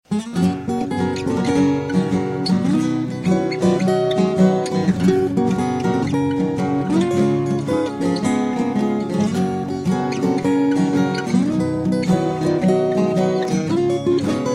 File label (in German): pferde melody